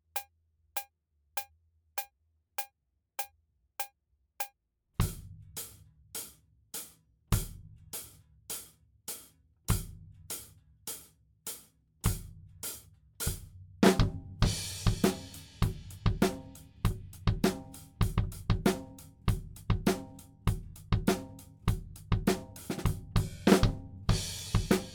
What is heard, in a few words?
Drums ONLY; Pre Pro